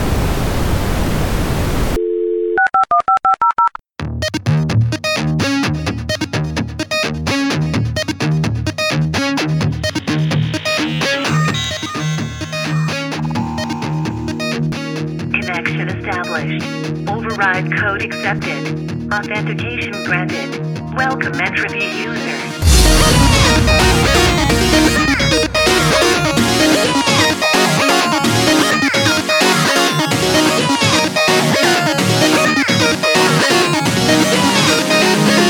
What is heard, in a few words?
Jingle